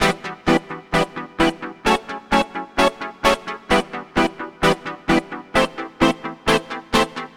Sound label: Synth Pattern; Electrocution